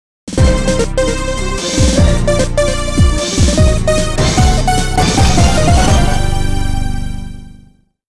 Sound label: Game Start